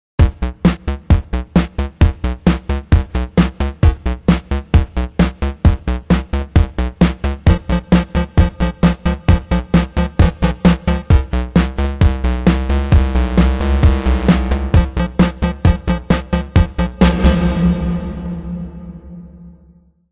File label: Finishing Move! (8-Bit Leviathan); Hell Yeah! Wrath of the Dead Rabbit